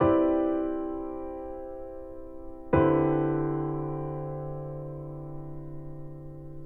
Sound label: 9th and fourth inversion